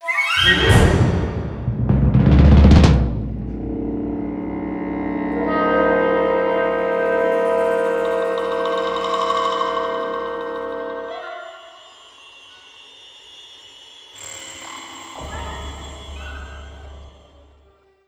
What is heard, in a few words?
Horror